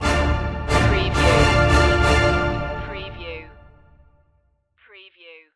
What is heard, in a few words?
stinger no choir